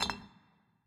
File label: ambience bottles; dead or alive 2